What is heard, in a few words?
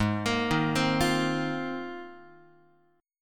G sharp 6th Add 9th G sharp Guitar; arpeggiated; JGuitar